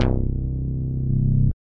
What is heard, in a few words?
Bass, operator; Instruments; HUSkateer Bass; Ableton; Factory Packs; Synth Essentials